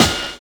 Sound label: PLANE BEA; Univers Sons